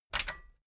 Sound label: metal button stereo click enable fast